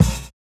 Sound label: Kick, jungle breaks drum sounds